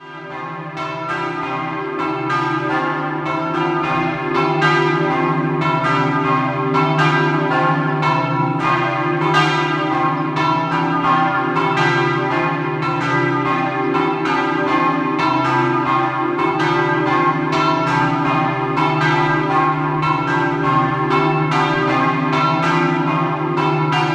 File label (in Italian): Bagnarola, campane